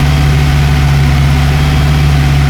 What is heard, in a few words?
engine loop; soundscrate